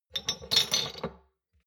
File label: shot glasses pull out medium, gastronomie; berufe; soundpool